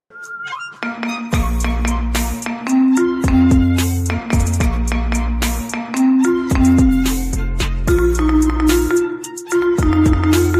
Marimba Remix

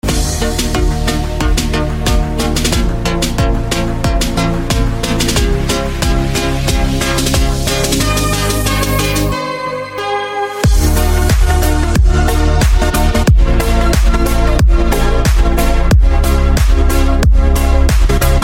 Instrumental

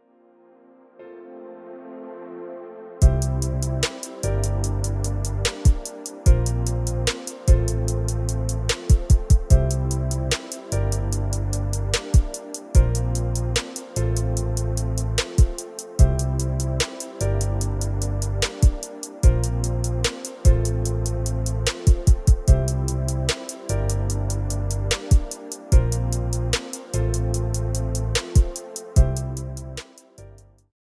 Instrumental

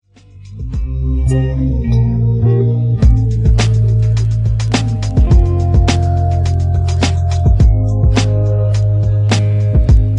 Instrumental

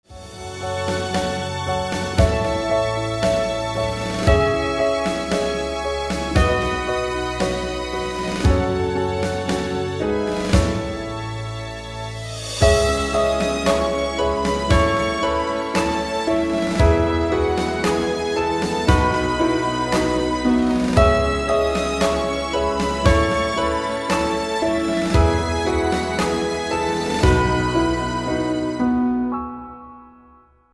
Instrumental

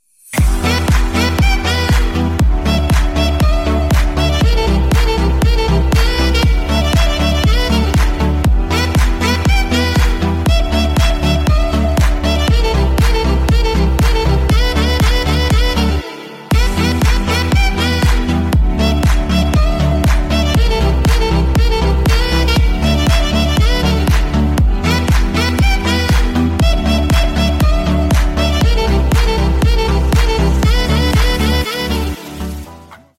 Saxophone

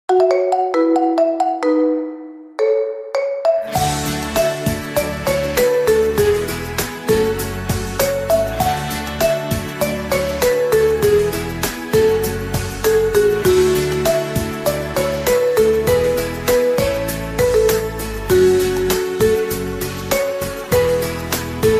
Marimba Remix

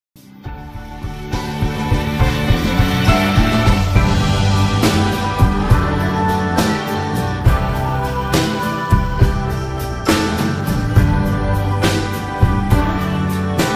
Instrumental

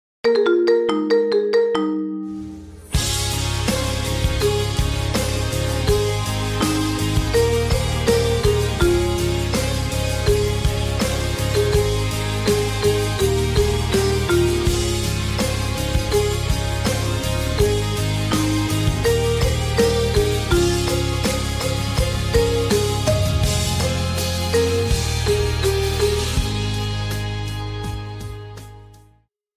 Marimba Remix

